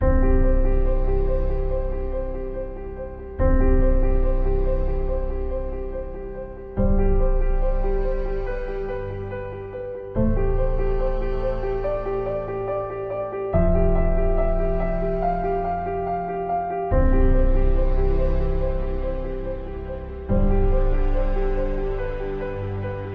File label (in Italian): sottofondo; Rimer Srl